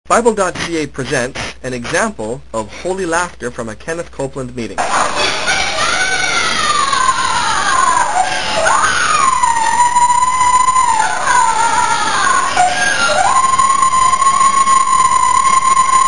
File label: tongues holy laughter woman